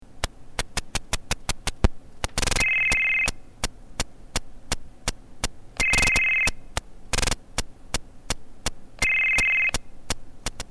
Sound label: BlackBerry Nextel RFI Ring